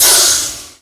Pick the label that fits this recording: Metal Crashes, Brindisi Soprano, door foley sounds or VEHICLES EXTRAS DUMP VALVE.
VEHICLES EXTRAS DUMP VALVE